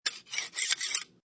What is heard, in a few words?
Knife Sharpener Sharpen Kitchen Knife; Scrapes; Sound Effects:Objects; Lynne Music